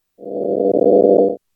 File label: Electric Arc